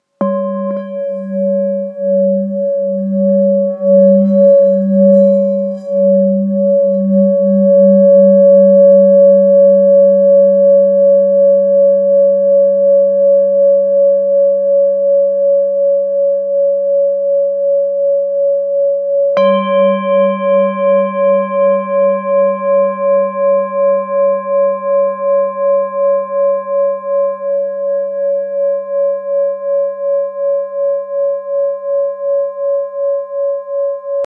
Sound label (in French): Bengale Do Diese, bols chantants